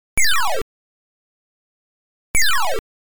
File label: Enemies Falling; Oretachi Game Center Zoku; BurgerTime